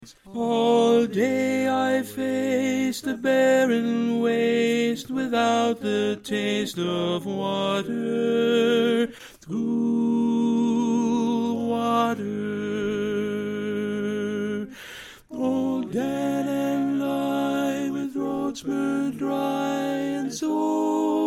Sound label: Lead